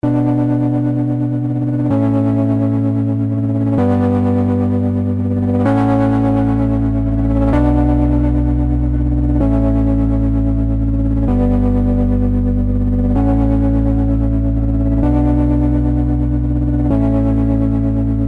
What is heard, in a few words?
Melodic Tech Voices; Vengeance Sound Avenger 2; PD Detektor